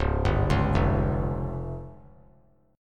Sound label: C sharp Major Flat 5th; C#Mb5 arpeggiated; JGuitar